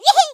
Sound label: rat victory; Rats